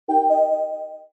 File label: Sms Warning; Samsung SGH-E760